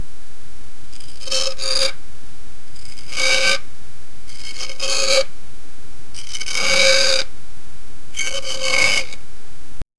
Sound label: realistic sound of one wo, scratch